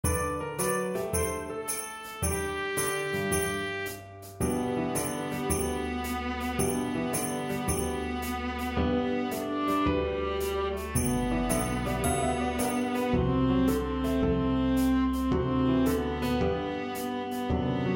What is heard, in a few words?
Viola Track with Melody; Jingle Bells